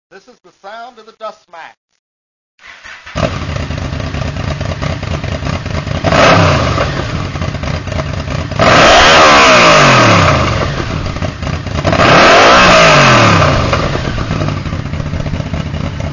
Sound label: Vmax